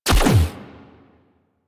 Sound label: Turret Fire; Vehicles; Crew Ships; Weapons; Warframe; Rail Jack; Corpus Crew Ship